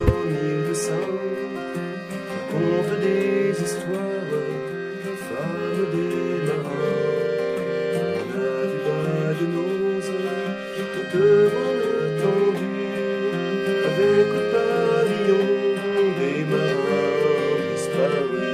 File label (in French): guitare accordéon